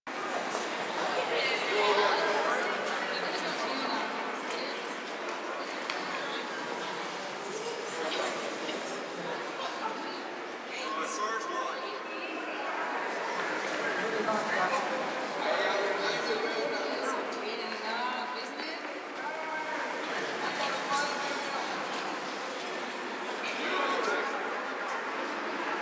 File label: Town Walla Loop; Exterior; Ambience; Duviri; Warframe